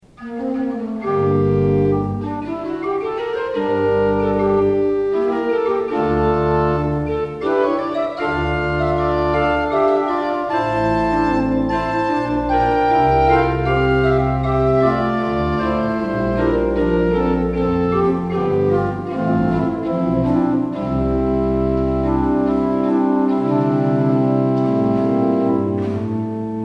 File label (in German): Coppel und Salicional